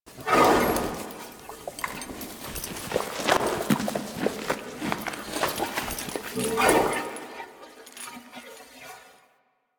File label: Horse Idle Grazing; Vehicles; Duviri